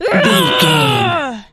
voice female battle shout

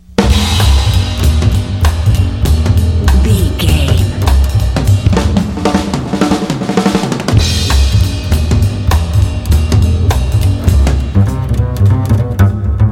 hip jazzters

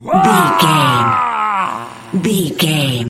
voice male battle shout long

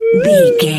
whistle slide wobble notes short

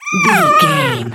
goblin fairy death

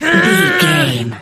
voice female effort growl

